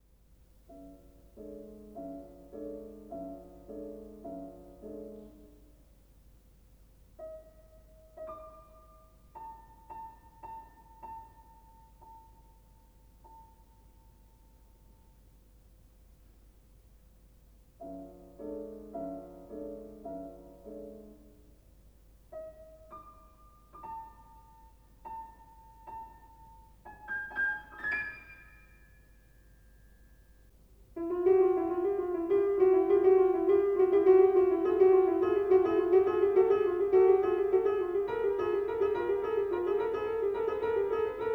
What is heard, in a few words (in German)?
Klavierstücke